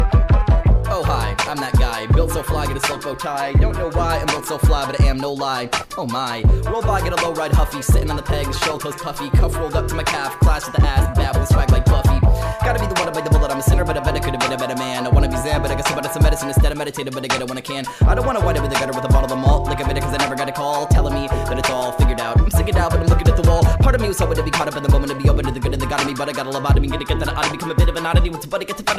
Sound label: Fast Rap